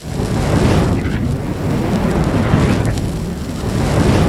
incinerate projectile, fire

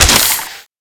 skeleton injured

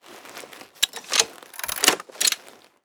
crossbow reload

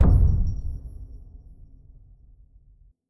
ui skills stop; perks

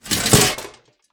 body metal close, containers

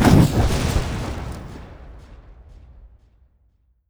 npc atronach flame conjure

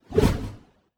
axe 2 hand bound swing

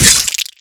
icicle sticky flesh; frost; impactbreak

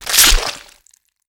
kill stab out

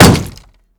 shield light block

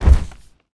bear foot front

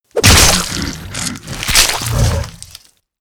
npc kill; 1hm troll

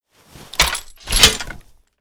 blunt 2 hand draw

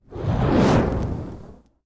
fire projectile

